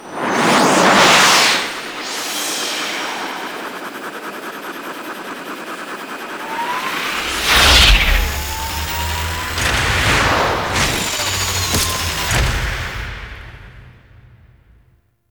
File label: Ship Extraction; Vehicles; Warframe; Liset; Liset Flight; Grineer Ship Liset